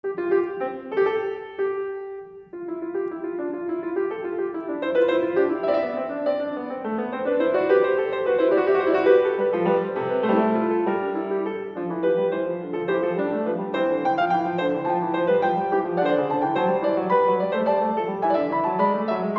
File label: Fugue in C minor; Johann Sebastian Bach